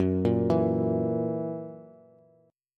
F sharp 7th, Bass; x,9,8,9; arpeggiated

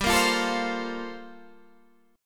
G Minor 11th Guitar Standard, Gm11 chord; strummed; JGuitar

